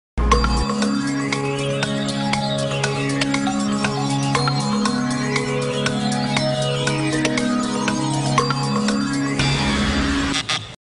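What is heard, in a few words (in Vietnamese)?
nhạc đếm ngược hết giờ countdown 10s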